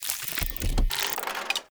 Mask Open, UI; Duviri; Acrithis

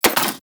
Rifle Mech Alt; Thanotech

